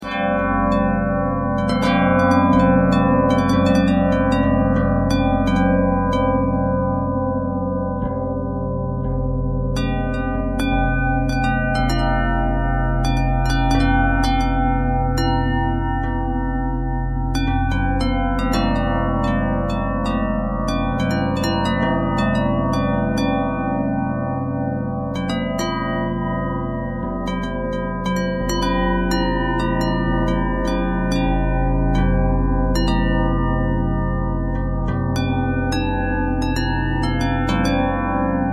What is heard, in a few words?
bell twist; bells